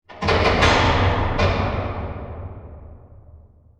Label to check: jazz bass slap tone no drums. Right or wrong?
Wrong